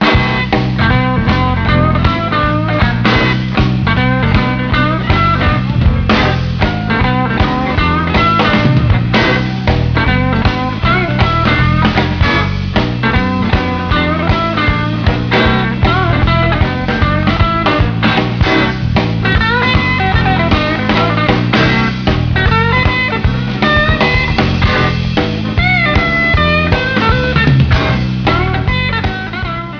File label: shuffle